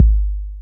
TR-808 Kick, Roland TR-808

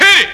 RAVE

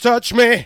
VOX SUNG; AMG Megabass REMIX! VOL-2

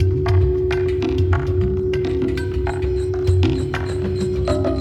Ambi Groove

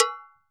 Campana open; Best Service; Festa Latina VOL-1; Single-Hits

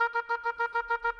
Oboes; Orchestral; Sonic Foundry (Sony Creative Software); Orchestral VOL-2; Modern

